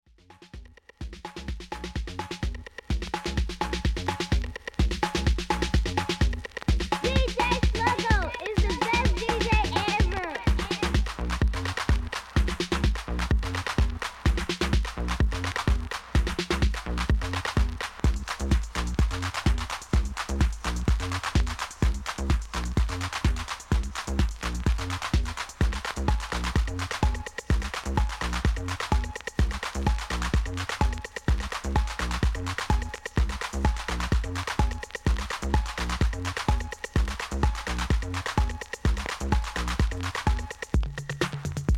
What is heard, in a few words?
Old School Mix With Drops